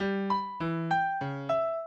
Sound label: minuet, mozart